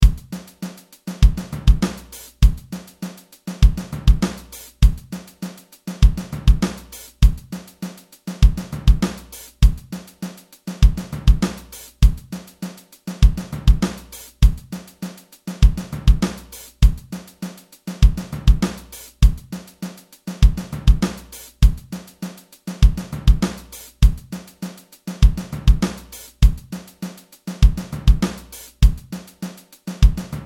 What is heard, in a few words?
16th note drum groove